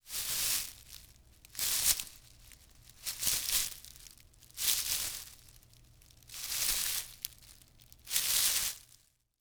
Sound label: Foley Footstep Human Walking Through Tall Grass; Foley Footsteps